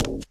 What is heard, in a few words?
glass, footsteps, run